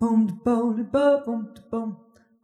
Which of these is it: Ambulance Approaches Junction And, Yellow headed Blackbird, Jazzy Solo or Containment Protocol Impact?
Jazzy Solo